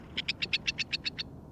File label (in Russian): kuropatki